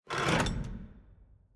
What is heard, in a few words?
Fusebox Exit; Puzzle